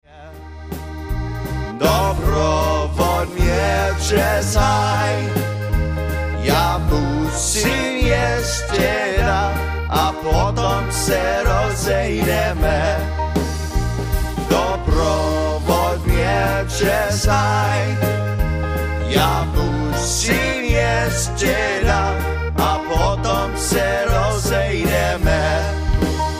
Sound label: Waltz